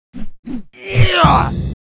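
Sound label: Mob attack; Monster; 묘족; 하문왕자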